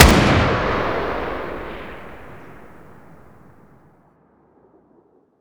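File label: fire dist 762x39 rifle ext; gmod; arccw uc commons attachments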